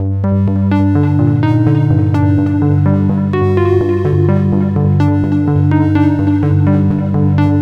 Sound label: Lead